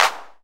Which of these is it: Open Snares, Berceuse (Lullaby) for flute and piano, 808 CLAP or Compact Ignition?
808 CLAP